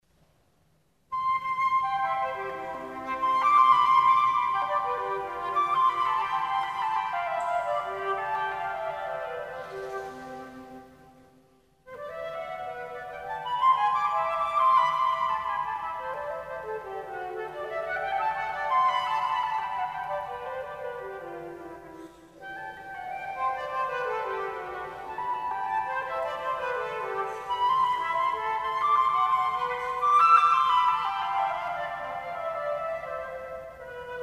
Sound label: Flauto solo Courante; Johann Sebastian BACH; aus der Suite BWV 1009 für Flöte alein